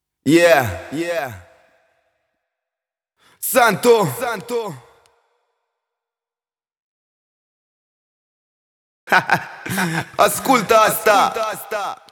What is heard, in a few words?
freestyle accapella